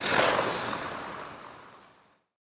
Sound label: ice slide; Adventure Time - Elemental; ThrillGames